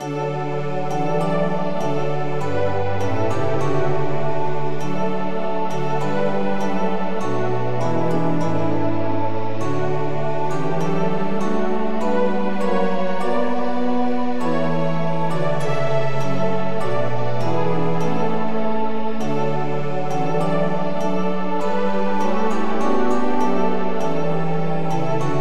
Sing aloud to God our strength polyinstru; hymns

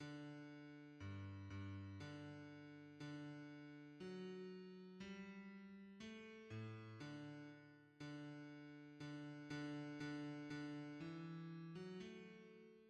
bass, hymns; Deja pour nous a lui laurore

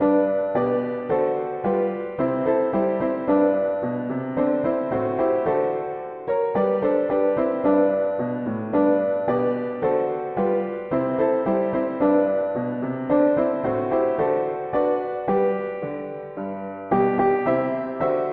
mono piano; hymns

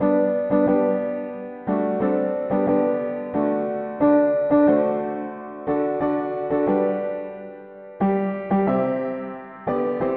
mono piano; De la croix la grace coule; hymns

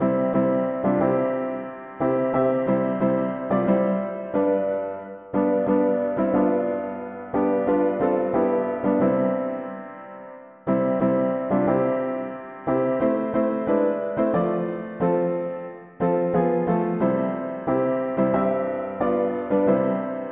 piano; hymns; Servez toujours lEternel avec joie

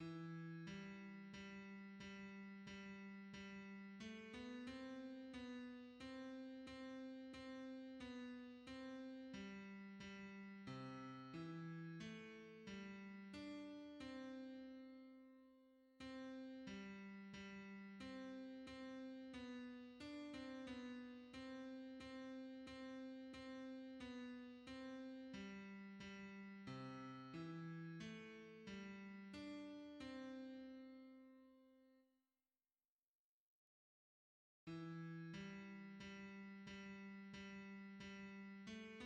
hymns, tenor; To wait for that appointed day

